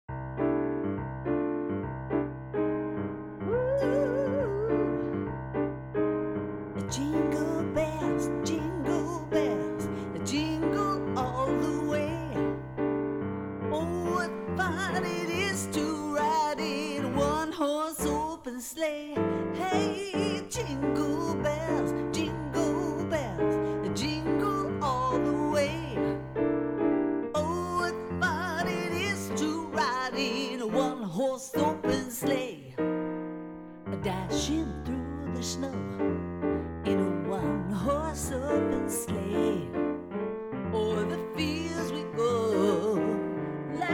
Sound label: Jingle Bells